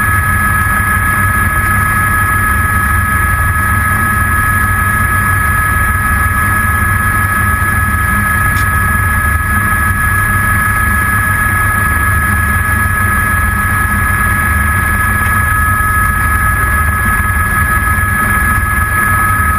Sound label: running internal, AS350; FGUK